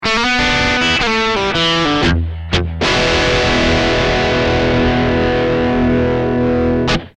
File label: dirty bridge coil; smoke stack ii; axetec